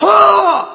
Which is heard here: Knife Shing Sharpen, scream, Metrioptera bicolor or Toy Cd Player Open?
scream